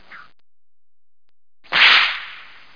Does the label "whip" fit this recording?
Yes